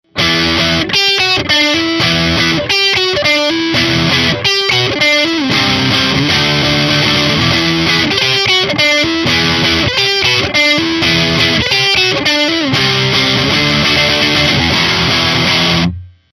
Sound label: guitar orange rocker; guitar orange rocker ppc412; Amplitube 3 5